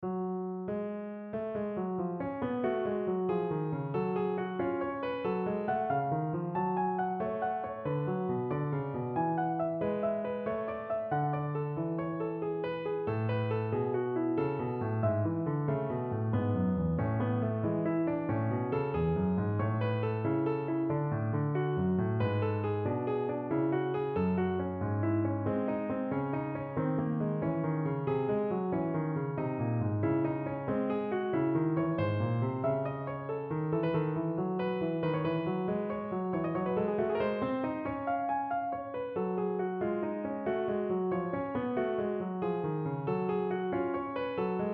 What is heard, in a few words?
F♯ minor Invention